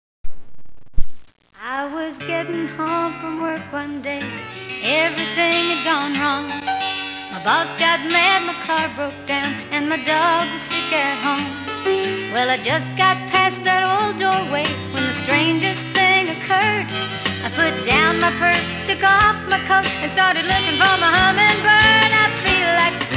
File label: Hummingbird Jingle